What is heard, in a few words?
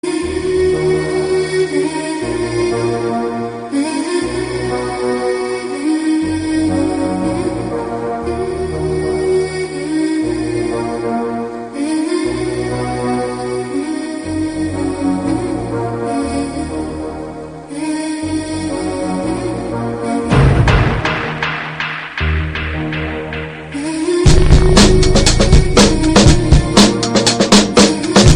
Romantic Valentines